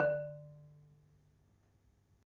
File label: Marimba, D1; Terkelsen